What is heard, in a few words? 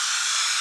Gas Loop; Worms3D